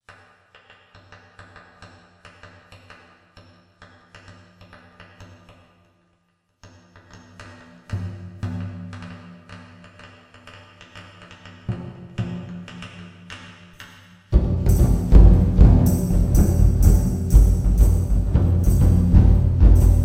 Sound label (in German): trommel experiment